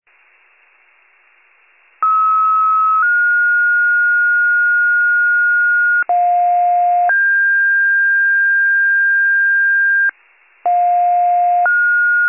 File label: fire, pager alert